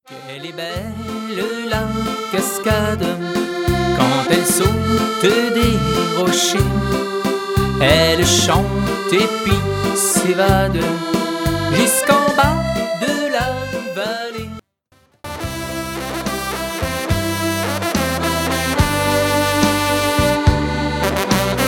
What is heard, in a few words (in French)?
L'Accordeon fait son bal